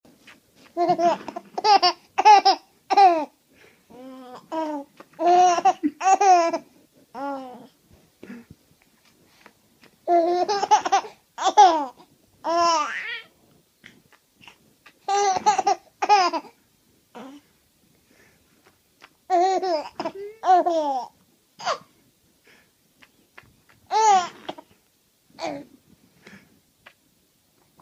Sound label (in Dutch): lachen